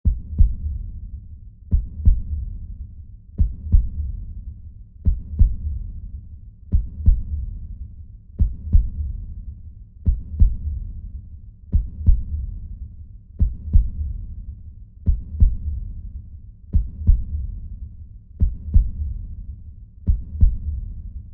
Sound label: Heart